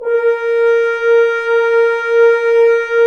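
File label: BRS, French Horns Dry f; Roland L-CDX-03 Disk 2; F.Horns 2 f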